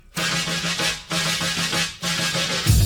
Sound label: slow fill, drums